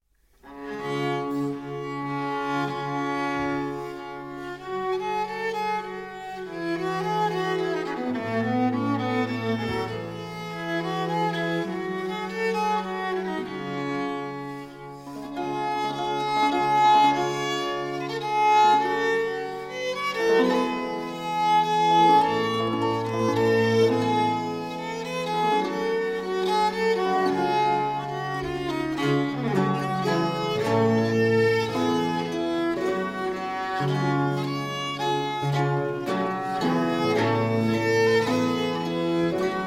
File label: Ricercare G-moll; Diego Ortiz